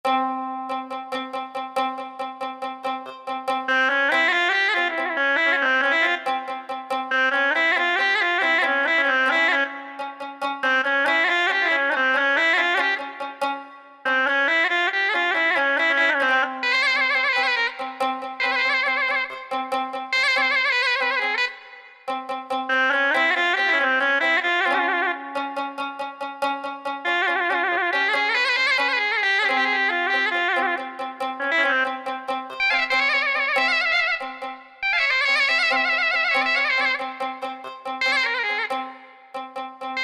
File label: Kanun Zurna